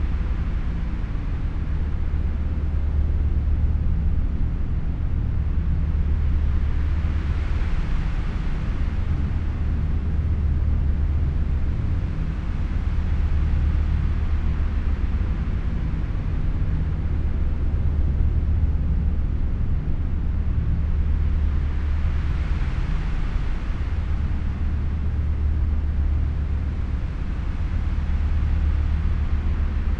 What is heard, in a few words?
ship colony ship idle; Stellaris; toxoids; ships